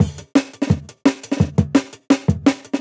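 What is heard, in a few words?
Jungle Breaks, 170 bpm; SP1300; Original Jungle Breaks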